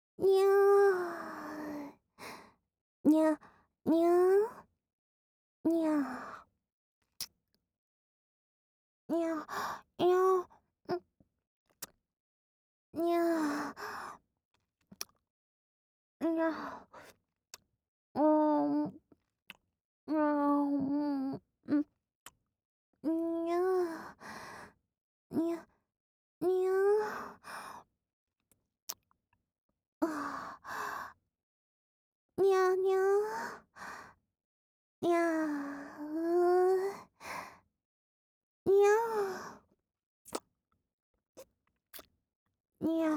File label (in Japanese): にゃんにゃんちゅっちゅ音声